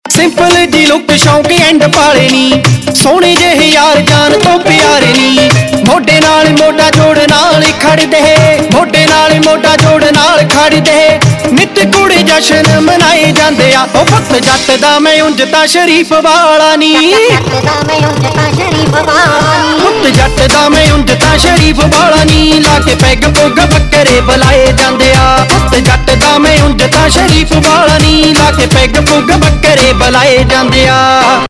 Ringtone, Punjabi Pop

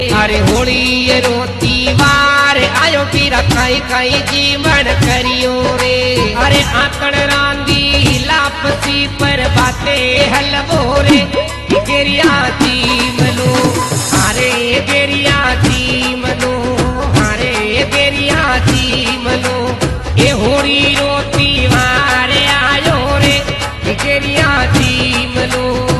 Holi Bhojpuri

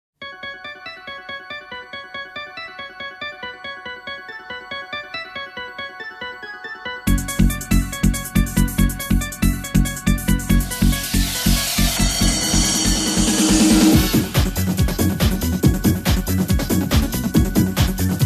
Bhangra; Love Birds (1995)

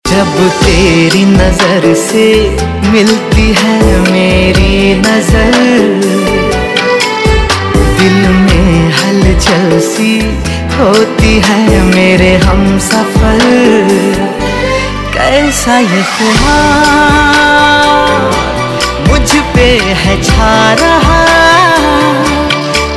Love Song Ringtone

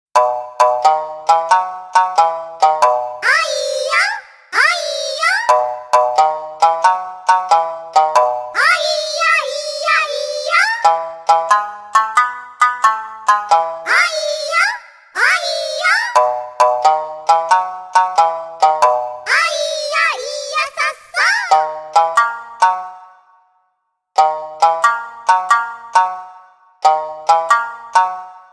konata bgm

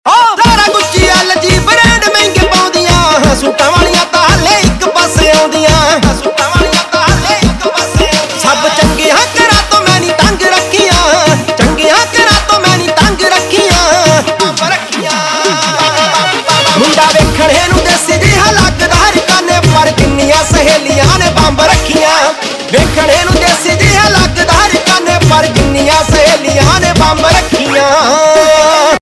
Ringtone, Punjabi

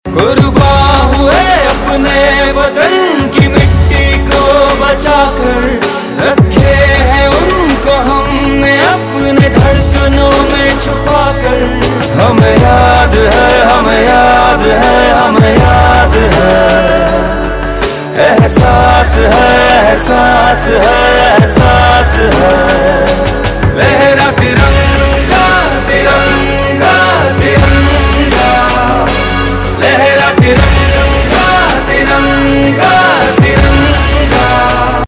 Ringtone, Punjabi Pop

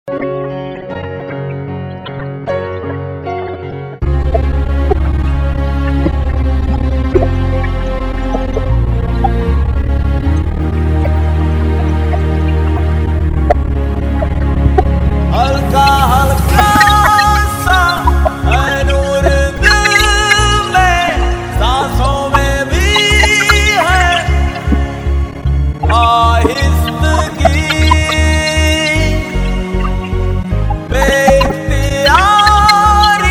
Promo Song

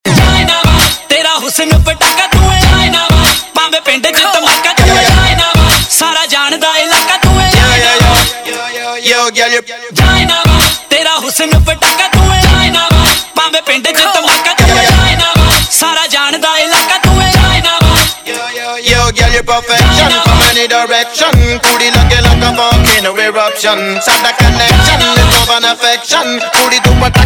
Ringtone, Punjabi Pop

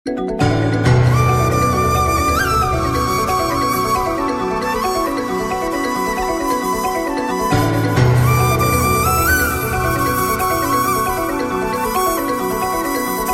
Flute; Baadshah

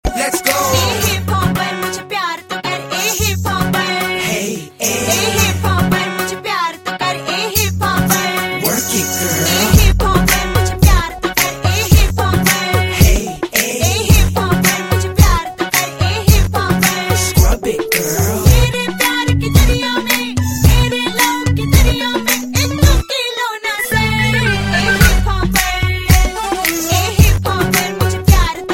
hindi hiphop; Funny; Ringtones

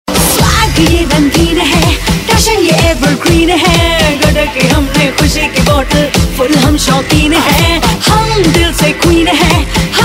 Theme Tune; TV Serial Tone; And TV; Queens Hain Hum

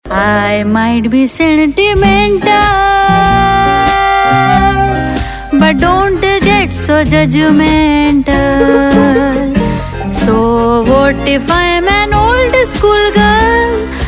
Haryanvi, Bollywood; Tanu Weds Manu Returns

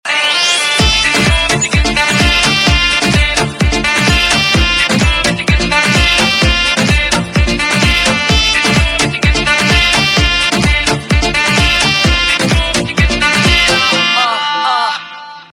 Theme Tone Ringtone, Punjabi Pop

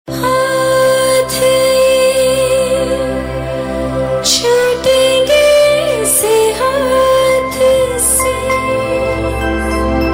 Female; TV Serial Tone; Star One

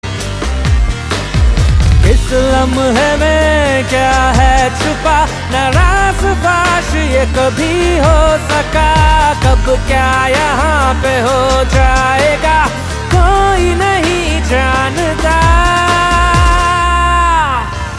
Male; Bollywood

